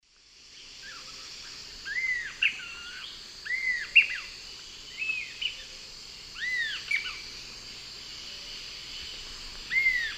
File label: Sirystes sibilator, call and song 2 birds; S. America; Eastern Lowlands